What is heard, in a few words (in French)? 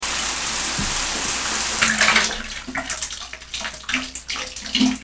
Douche